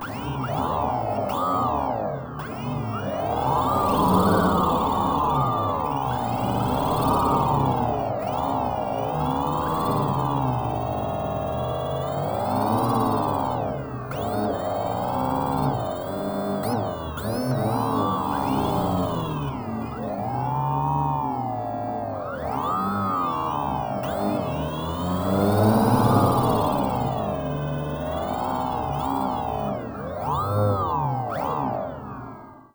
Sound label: Quadcopter engine random coilmic